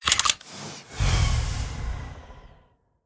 Gunshot Warning Idle; SFX; The Town

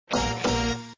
Level Completed; Go Diego Go Rainforest Adventure

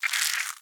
Egg Shake Louder; The Inn

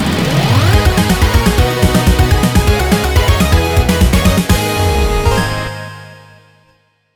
Level Clear 4 Stars; Not a Hero

